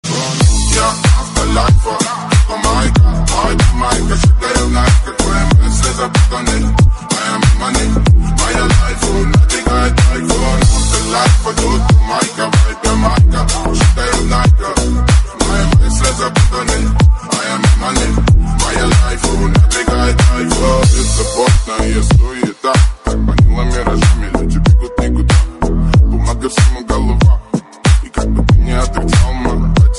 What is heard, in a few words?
song dj remix